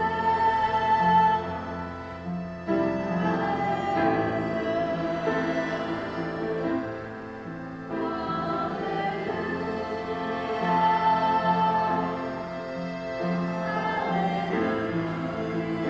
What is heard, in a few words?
audience singing hallelujah; the bethesda theater ninja gig